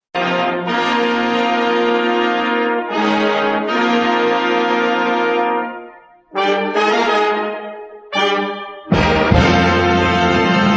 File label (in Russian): fanfara